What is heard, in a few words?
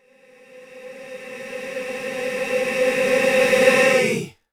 Reverb Athmospheres, FX Vocals; Vengeance Samples